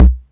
Roland Tr-606 Kick; Drums

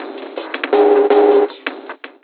Morph Bumpers, Fx; Sonic Foundry (Sony Creative Software); Esoterik Beatz

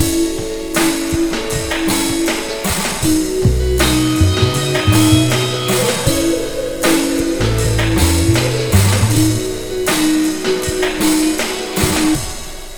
Slo Mo Full Wak-F#, Slo Mo Funk Mixes, Lounge; Zero-G Kocktail Kollection; Construction Kitz (Lounge)

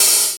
snare+hat; DRUMS

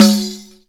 req drum; Ethnic Percussion

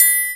TRI, triangles; Univers Sons; Basicussions

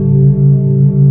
DEEP; Best Service Dream Experience; SF+EFFECTS

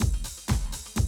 125, Classic Drum Loops

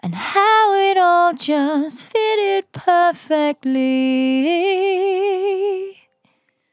On A Star 65bpm F, Vocal; Zero-G

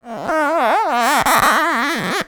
Wet finger on book, cartoon Foley Fx; musicradar; cartoon caper

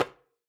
udo slap pp, hand drums; SoundBytes - Early Patches

